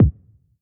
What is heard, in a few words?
kick, electronic